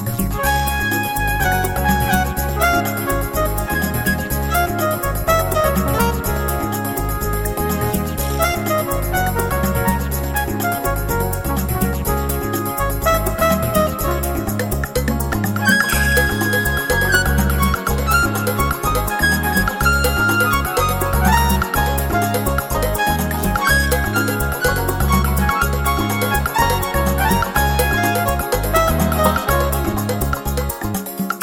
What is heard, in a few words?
Smooth Latin Jazz